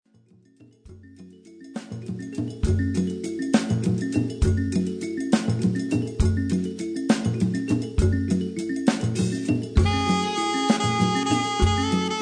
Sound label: KALIMBA